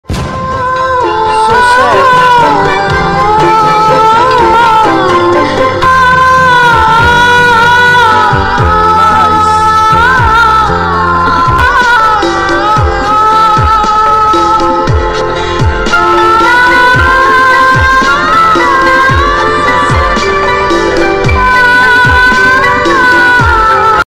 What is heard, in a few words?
ringtone sad; ajay devgan; ishq movie